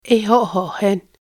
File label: clap